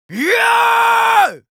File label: Yells, Viking Vocal